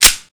slide release, weapons, elite

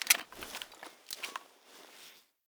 mp5 slide back, weapons; mp5navy

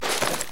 aug forearm, weapons

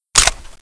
awp clip out, weapons

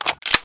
sg552 clip in, weapons

